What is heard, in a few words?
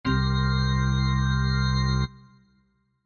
Organ Blues, Organ Blues 7Th Chord; Keyboard; Keyboards; Blastwave